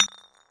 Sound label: glass bottle impact hard; physics